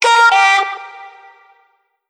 Omnivox oneshot Gm, Vocal; One Shots; Slate Digital Omnivox Vocal Sample Pack

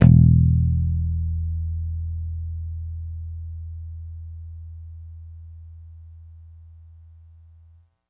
Bass one shot Pick Long E, Electric Bass; Slate Digital BASSHOLE Sample Pack

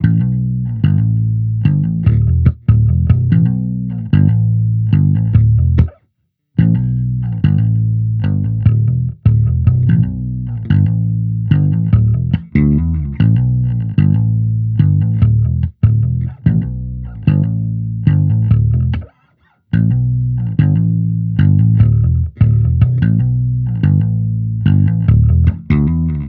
Amped loop 73 Em, Electric Bass Loops; Slate Digital BASSHOLE Sample Pack

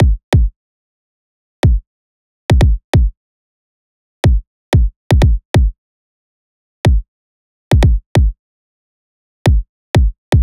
kick loop 92; Pop; Drums; Kick Loops; Slate; SD PASADENA Pop Sample Pack

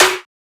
snare cram; one shots; SEISMIC Drum Sample Pack; Slate

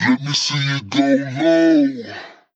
phrase, Vocal; Slate Digital Omnivox Vocal Sample Pack

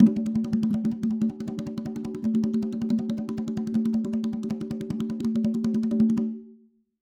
conga Roll; raw perc one shots; SEISMIC Drum Sample Pack; Slate

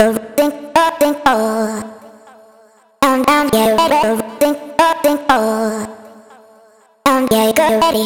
Mellow vocal chop, 119, Am; Slate Digital Omnivox Vocal Sample Pack; Chops

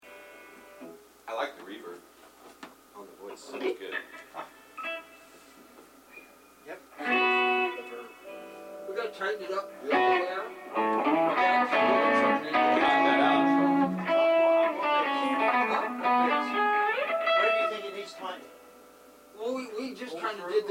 Background Chatter Mix

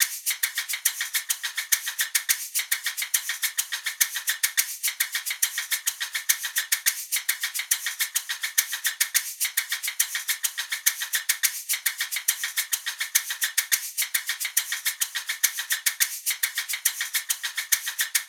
perc 105; Drum Sample Pack; Loops; SD SEISMIC; Slate

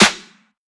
snare red, Hip-Hop Sample Pack, Drum One Shots; Drums; Slate; CAICOS Hip-Hop Sample Pack

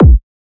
kick boom; Drum Sample Pack; One Shots; Slate; SEISMIC

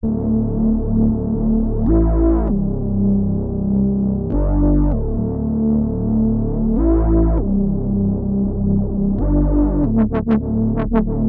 Synth loop 98 Dm, Synth Bass Loops; Slate Digital BASSHOLE Sample Pack

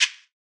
perc shakey; one shots; SEISMIC Drum Sample Pack; Slate

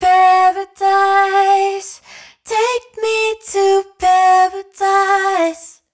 hook Paradise 120 Em wet, Vocal; Slate Digital Omnivox Vocal Sample Pack

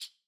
rattles Single Hit; Slate; SD SEISMIC Drum Sample Pack

